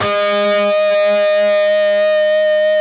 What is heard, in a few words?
PRS lead